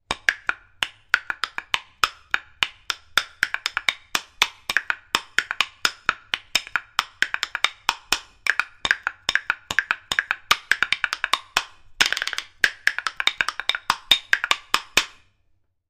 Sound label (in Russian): lojki; instruments